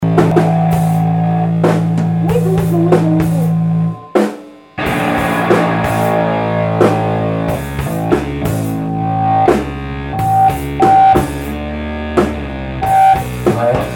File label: noise session idea